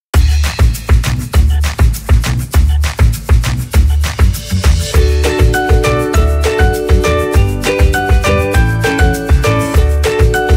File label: Children's Music, Happy Upbeat Music, Instrumental Music For Kids